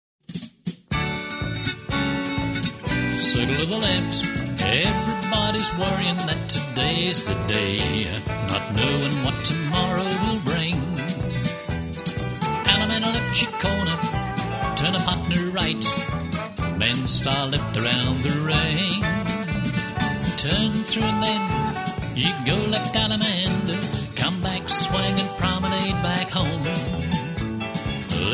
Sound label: vocal; square dance music